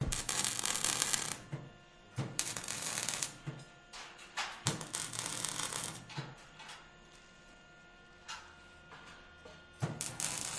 welding with electrical sparks activity in the background from workers; Sound Ex Machina